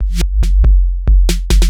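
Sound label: DRUMLOOP ELECTRO 140; Techno2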